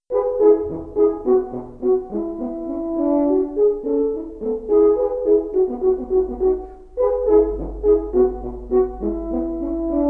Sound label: allegro, 2 horns; Stich J.V.: Duet Nr. 7Allegro